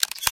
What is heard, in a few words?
m3 insert shell, m37; weapons